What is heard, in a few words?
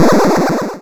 Cry